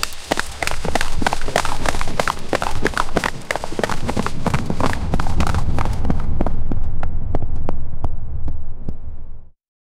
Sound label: sound of a steppe cavalry